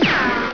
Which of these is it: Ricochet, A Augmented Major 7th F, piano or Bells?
Ricochet